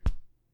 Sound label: Punch Land Soft